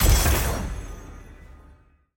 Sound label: ui shop button click